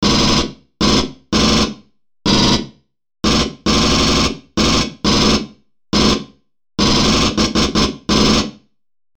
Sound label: M41A Pulse Rifle; FXHome; Bullet and Gun